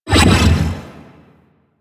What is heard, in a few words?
Cloak Enable; Warframe; Pets; Shade